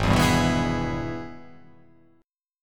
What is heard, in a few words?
Gsharp Minor Major 7th Sharp 5th